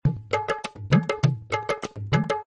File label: tabla